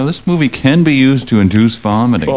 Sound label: vomiting